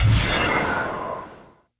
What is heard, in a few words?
force jump